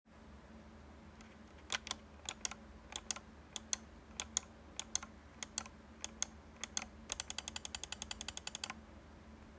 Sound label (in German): Linke Maustaste